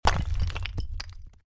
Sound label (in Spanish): golpea piedra